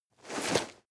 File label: nylon leather medium short